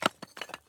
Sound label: Ceramic Break; Monster Destroyer